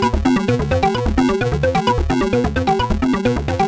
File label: Juno-60 Arp, 130BPM; musicradar; bitcrushed; Crushed Loops